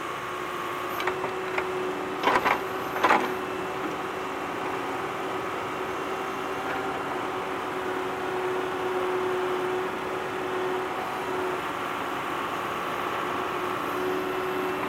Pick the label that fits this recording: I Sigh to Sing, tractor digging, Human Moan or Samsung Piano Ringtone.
tractor digging